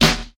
sizzle snare d sharp key, Royality free steel snare drum tuned to the D# note; sizzle snare sound; snare drum samples; one shots